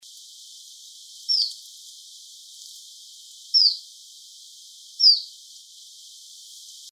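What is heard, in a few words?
Iberian Chiffchaff; March, 2001, Portugal (ibericus); Linnut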